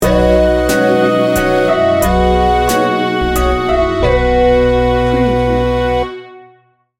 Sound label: sting bumper